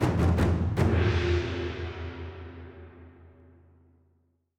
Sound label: COLOSSEUM STING